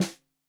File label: Snare 65 Reg Stereo, Deeply Sampled Drums